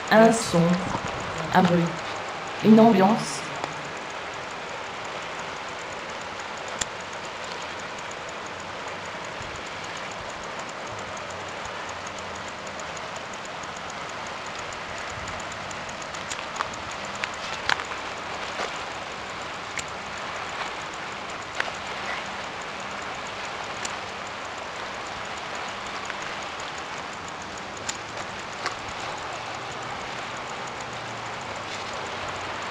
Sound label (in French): Un son un bruit une ambiance